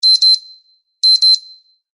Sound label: htc alarms beeps